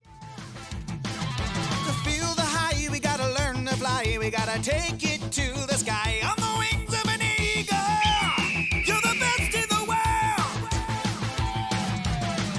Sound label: rock, singing